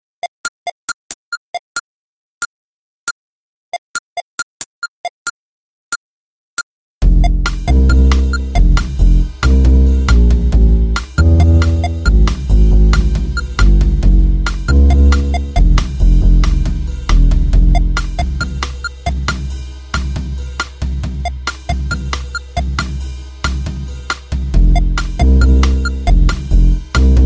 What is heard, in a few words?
bossa old